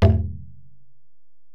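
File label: Acoustic Percussion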